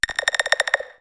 Robot Tone D4

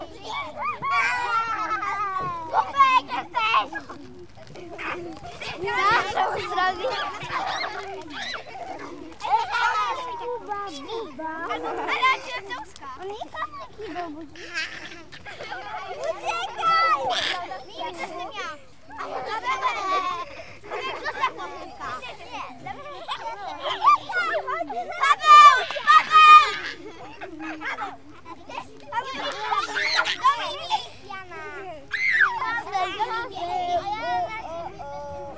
Playground

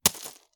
Brick Drop On Gravel

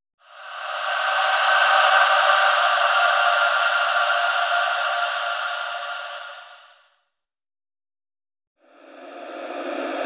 Mechanic Squeak Abit Stretched; Lg

